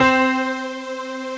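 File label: synth C, STAB, House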